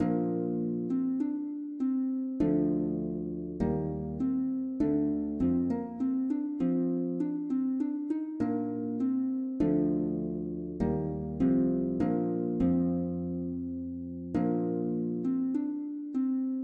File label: Menuet